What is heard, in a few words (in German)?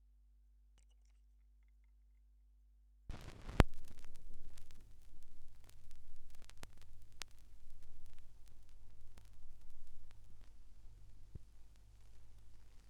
schallplattengeraeusche